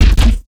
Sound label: Bass Shot; neuro; BIG5AUDIO